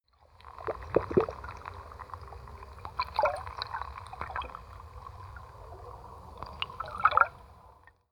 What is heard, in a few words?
sea